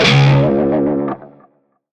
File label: Shawzin Flourish Oct One C Sharp; Music; Warframe; Narmer Shawzin